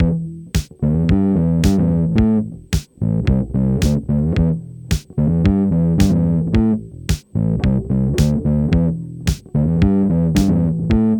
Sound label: disco